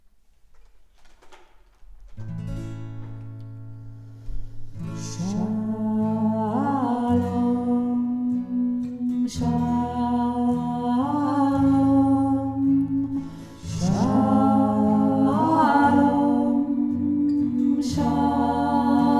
shalom 2 stimmen kanon

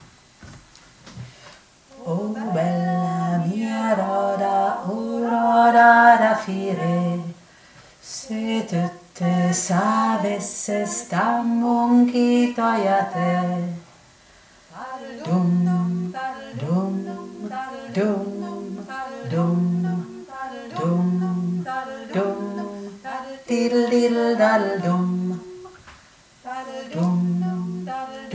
3 stimme; o bella mia roda

